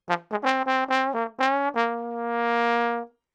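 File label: 126 Latin Jazz Trombone F minor; Brass; Pro Tools Essential Loops; Digidesign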